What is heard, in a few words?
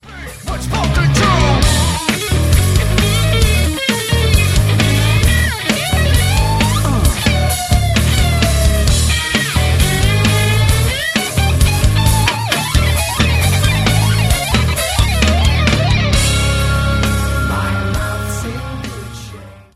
solo